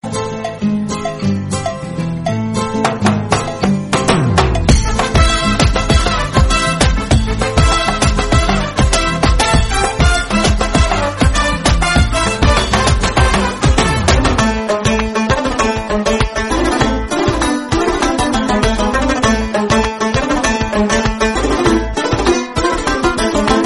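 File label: background music Telugu Ringtone; Pokiri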